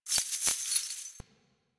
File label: Bird Body Shake; ambience; bird three body shake